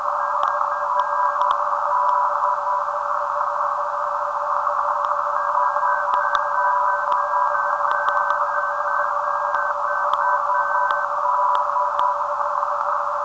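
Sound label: non-directional beacon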